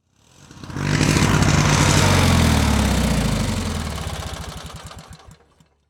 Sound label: Motor Running